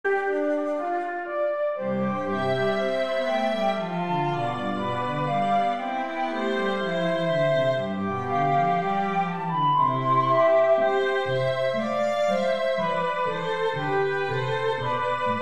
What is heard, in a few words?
Cradle Song; Garritan